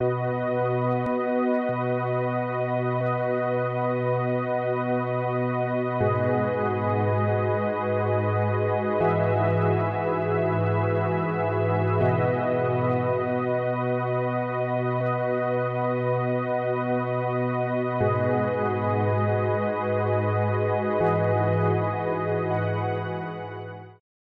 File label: PAD Mellow Heavenly Breeze; Synthelium